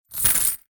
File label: foley money coins drop into purse or wallet; zapsplat